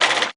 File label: typewriter; matilda